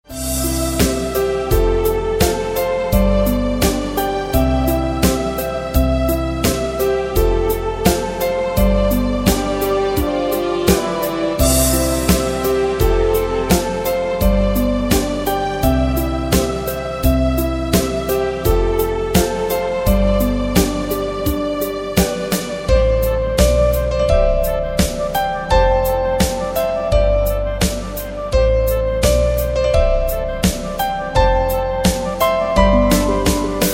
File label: Melody